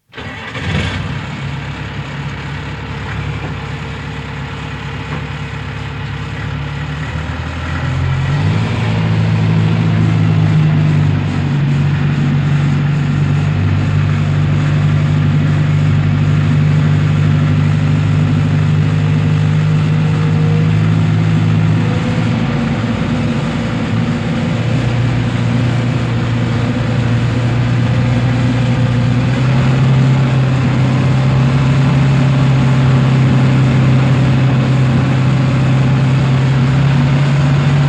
Interior,hill climb (2 ton diesel truck, make not known); Truck Sound Effects; BBC